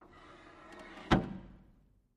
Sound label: Wood Seat Sliding; seats & stools